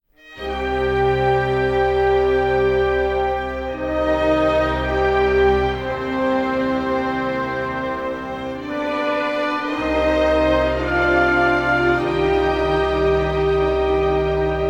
Concerto For Trombone and Orchestra on Albanian Folk Melodies; Mvt. 1. Movement I